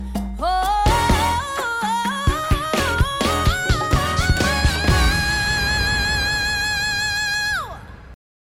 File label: hoh oo woh oh oo